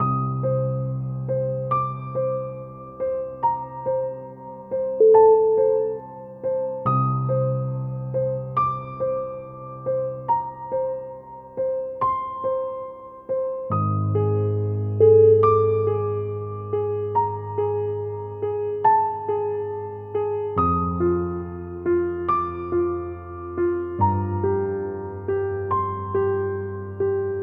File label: suspense piano